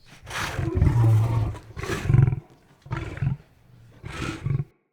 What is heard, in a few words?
Wolf Idle Trapped Groan, Animals Wolf Vocals